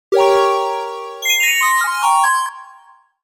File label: laugh; PaxSona; en US